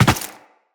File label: Land Default, Footsteps; Characters; Tenno